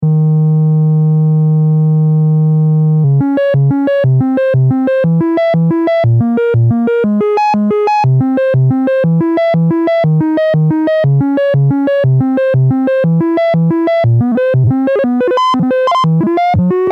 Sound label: paper synth sine wave expfm linfm